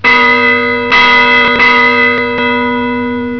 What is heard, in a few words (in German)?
Glocke; 999wavs